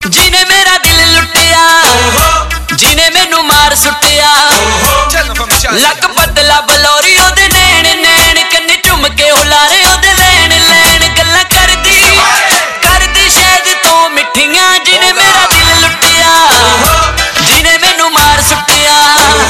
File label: happy valentines